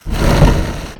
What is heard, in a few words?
stone walker